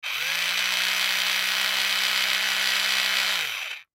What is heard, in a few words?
Toy Drill Electric, Toy Drill Electric On Run Off Struggle; Foley; Miscellaneous Toy; Blastwave